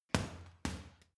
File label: boxing punching bag uppercut double medium